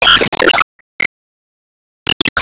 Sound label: Gatling Generate